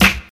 Clear Steel Snare Drum C Key, steel snare drum sound tuned to the C note